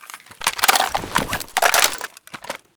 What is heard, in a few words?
saiga reload, weapons